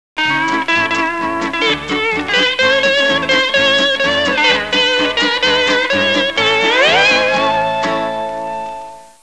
musica strumentale

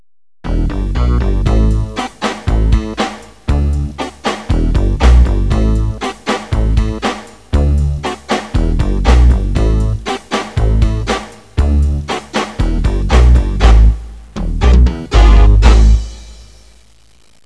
musica newage

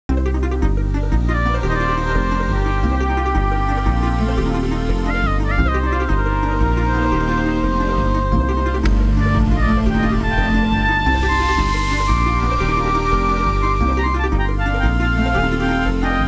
musica elettronica

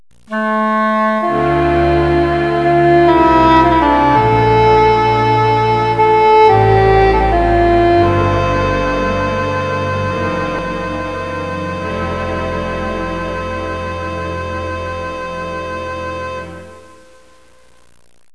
musica newage

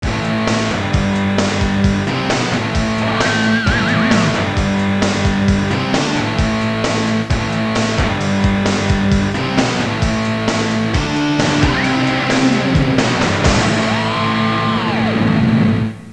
suonerie musica rock

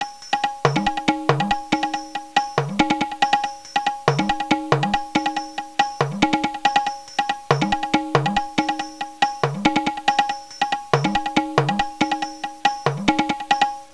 suonerie musica ritmica

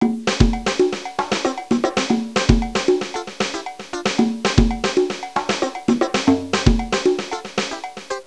suonerie musica ritmica; rhythm

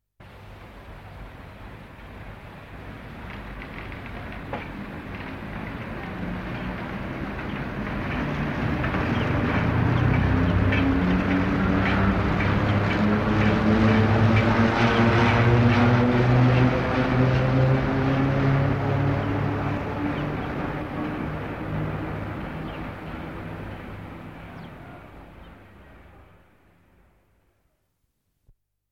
TRENO O METRO' ELETTRICO; trasporti; treni

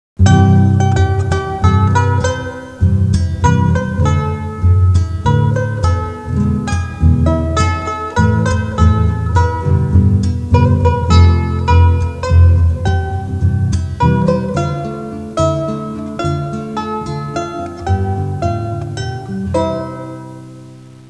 musica strumentale

